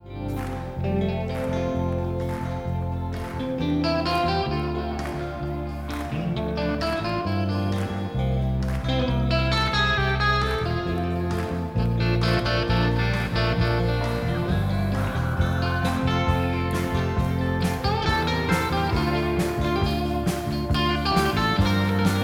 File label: Schecter Sunburst, STRAT; SCHECTER STRAT SUNBURST 1980; GUITARS